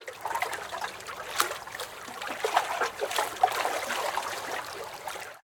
Ambience Splash

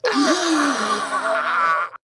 Nightmare Crowd Moan; gamerip

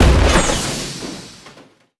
Drone; rabbids multiverse; iOS, macOS, Mobile

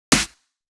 snare drum; LaRochelle

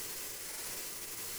Charge Loop; Hero Nail Art

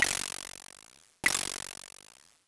Tennis; Sfx Tennis

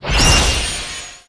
New Ice Cast

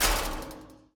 Footstep Metal-Fence

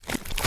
Physics Object Toon Bridge Slice

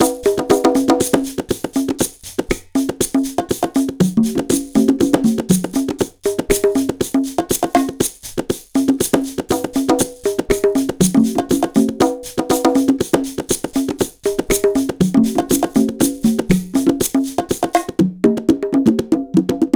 music beach bpm120; Donkey Kong Country Returns HD

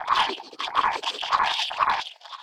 Dog Eat; unsighted

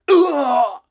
Miner Death; Col Miner Death

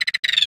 Spider Sound

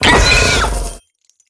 Enemy Zmey damaged

